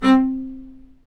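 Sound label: STRINGS, Low Marcato; Roland L-CD702; Vc Marc&Harm; Vc Marcato 1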